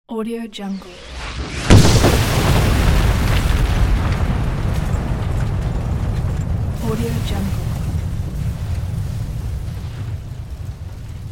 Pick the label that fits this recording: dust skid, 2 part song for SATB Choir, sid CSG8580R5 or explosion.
explosion